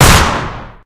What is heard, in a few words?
pistol; doom scout; video games; source engine; tf; weapons